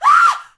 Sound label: girly scream; female characters; AoM-ARTofMyth